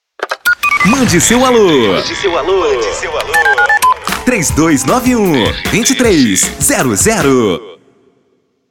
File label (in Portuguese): INTERCOM TELEFONE